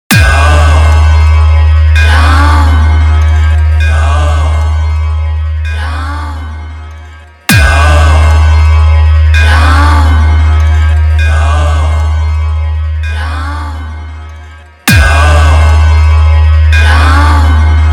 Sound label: Ram Navami Special Beat